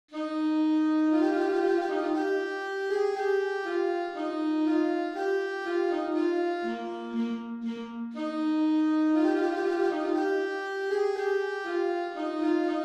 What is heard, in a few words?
trumpet voluntary; clarke jeremiah